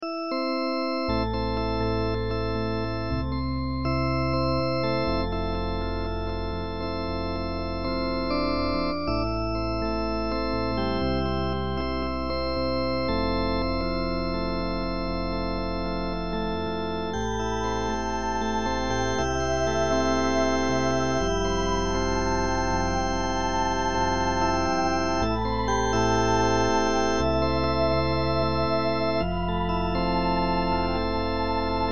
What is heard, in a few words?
birds